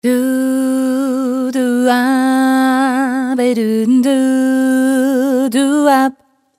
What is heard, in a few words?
original vocal